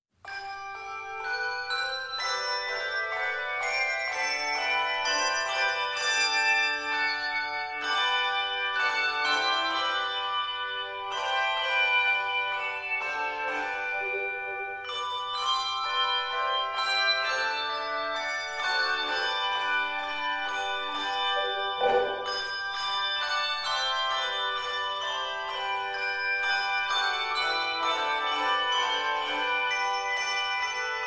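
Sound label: Handbells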